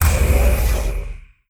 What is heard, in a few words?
ui queuing terraforming; Stellaris